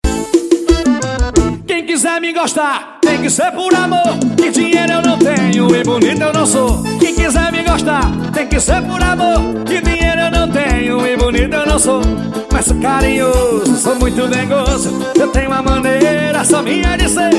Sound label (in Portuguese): Forró